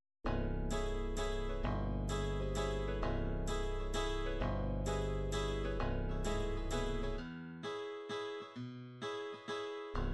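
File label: psr9000 country waltz, midi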